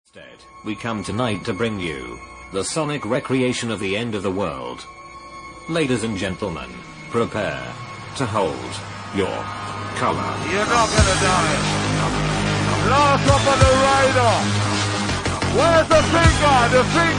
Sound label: DNB